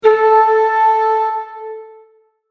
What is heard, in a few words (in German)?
Flötenton